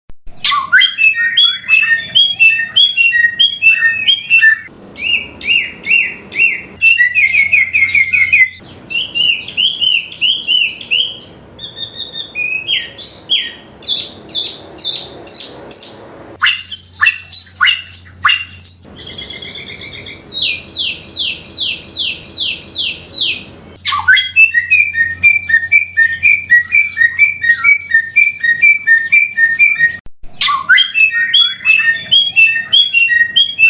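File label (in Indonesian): kombinasi samyong tiumi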